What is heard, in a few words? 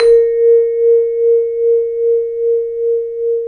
VIBRAPHON; ILIO